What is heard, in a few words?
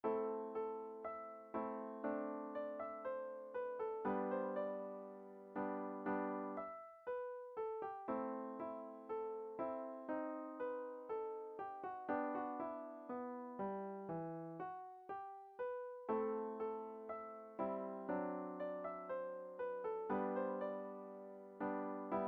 Polovetzeian Dance pedal, beginner piano music